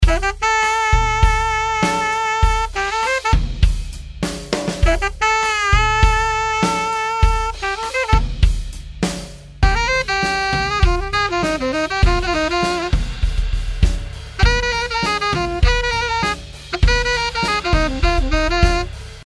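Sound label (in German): Saxophon, Zusammen mit Drums und wieder ohne Reel ADT; Waves Abbey Road Studios Reel ADT; Toontrack EZdrummer 2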